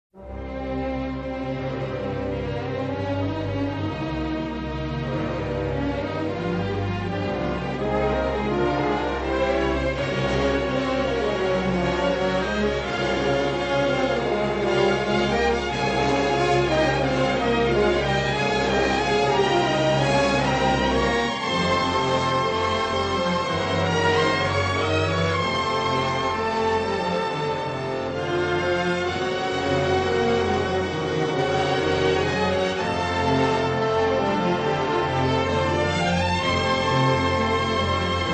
FINALE INSTRUMENTAL; Green Fire

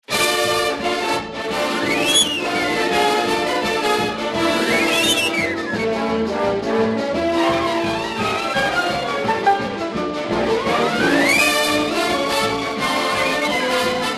Roller Skating Waltz

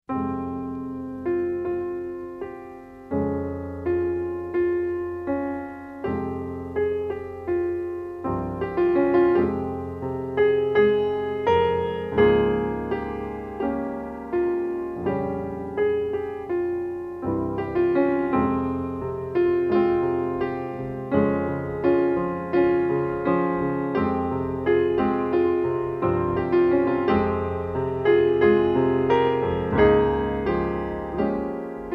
tora theme piano

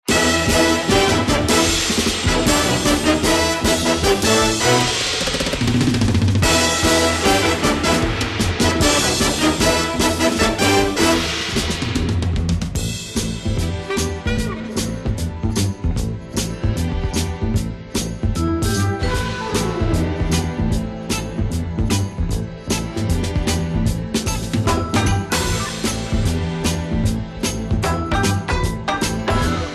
Main Title instrumental

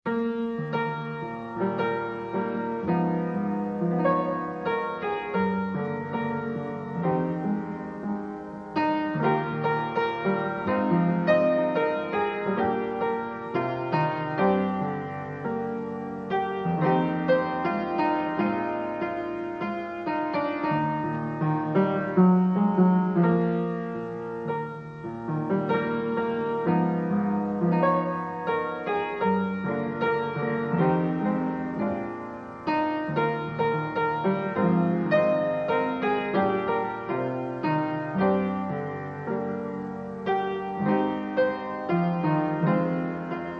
THEME PIANO REPRISE

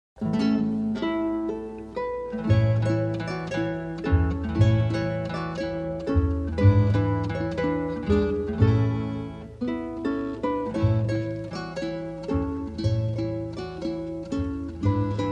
GUITAR SOURCE

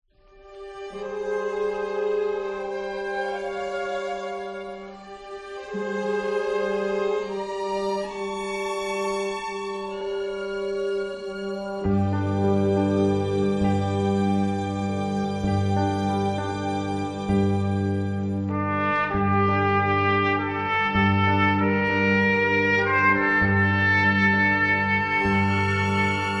End Title alternate without percussion

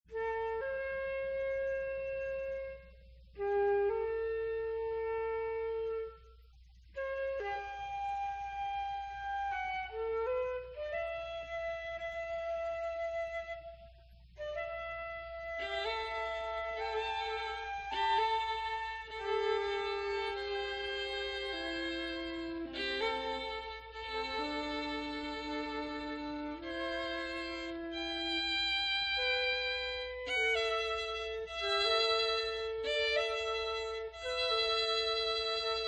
Flute and Violin

